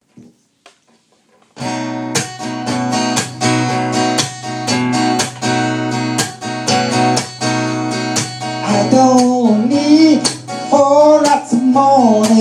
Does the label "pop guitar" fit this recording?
Yes